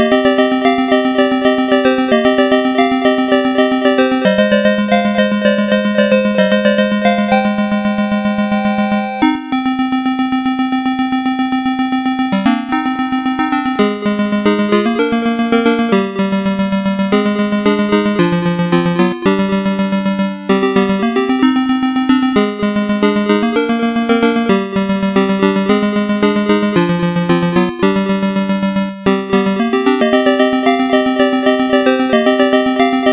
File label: Hidden Music; Hang On; SMS